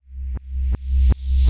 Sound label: PERCUSSN, Ambient, Drum